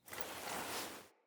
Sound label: Sparrow Landing Foley Raise Arm; Dendria; Envoy